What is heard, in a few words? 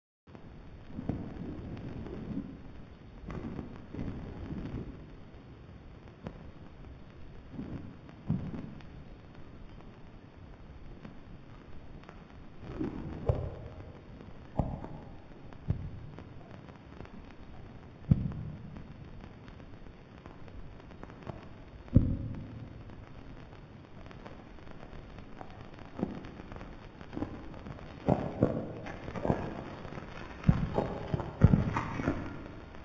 Signal; Mercury